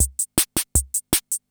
CR78; Best Service; XX Large Most Wanted; Sample CDs

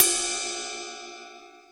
Ride Cymbal, Drum Machines Roland R-5

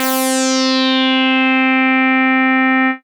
Synths Note C

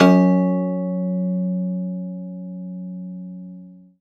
Rubbery Piano, prepared piano; Total Piano

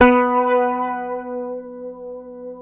Fingered bass B3 L; Sampleheads; Will Lee Bass Library

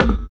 PERC, Drum & Bass; Ueberschall; Drum & Bass Flash Trackz

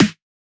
Snare, Dance Drum Kit; Drum Oneshots; Twilight; Monosounds

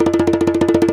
Ashiko Roll; Multisamples; Planet Earth; Digital Sound Factory Planet Earth

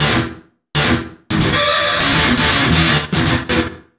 GTR, Chemical Beats

